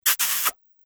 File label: Kiss; TCL M2L; hotknot